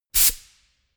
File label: pneumatic pump burst